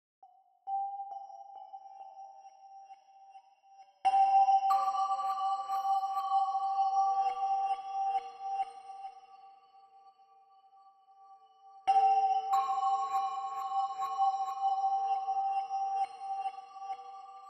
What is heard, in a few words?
music chimes